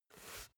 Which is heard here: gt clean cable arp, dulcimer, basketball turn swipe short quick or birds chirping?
basketball turn swipe short quick